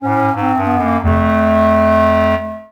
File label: Loss